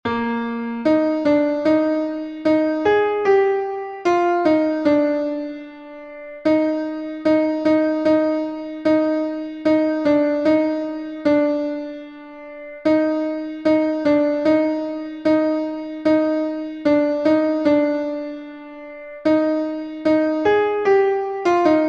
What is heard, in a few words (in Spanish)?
CONTRALTO, himnos en piano; 47 CANTAD ALEGRES AL SENOR; cantos del camino